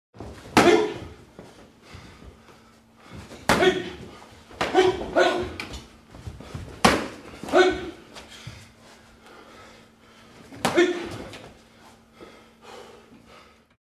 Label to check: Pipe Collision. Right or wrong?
Wrong